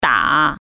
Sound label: hit